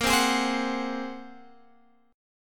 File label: Bb 7th Suspended 2nd Sharp 5th Bb, Guitar Open G; 20,17,18,17,19,x